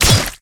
Melee Physical Heavy; Kilta

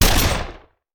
Shotgun; Sound Ideas; Guns

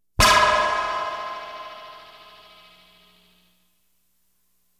Science Fiction effects, Lasers, Light beams

